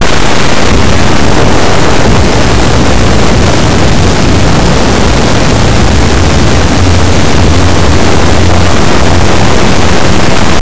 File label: walk; Blue Heat